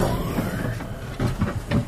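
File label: Hatchback Hydraulic Quiet, trunks & hoods; Hatchback Hydraulic Quieter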